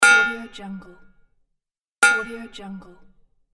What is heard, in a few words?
warning